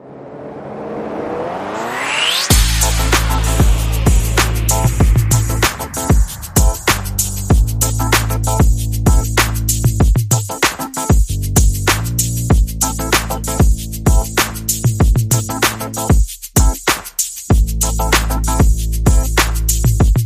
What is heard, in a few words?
No Synth Lead